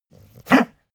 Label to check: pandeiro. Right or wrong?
Wrong